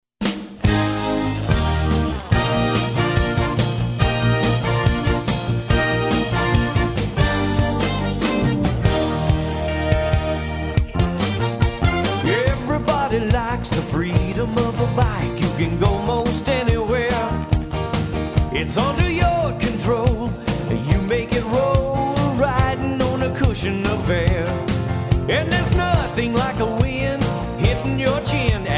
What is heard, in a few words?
Pop with Horns Kicks